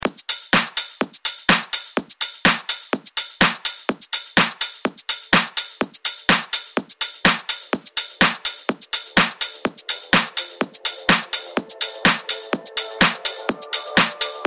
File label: EDM 125; Vegas Edit; 125 Bpm